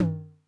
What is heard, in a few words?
Tom Mid Accent High Tone Max Decay, 909